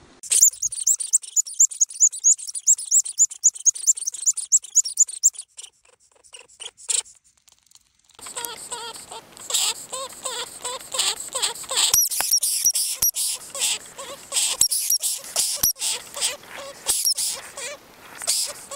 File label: lemming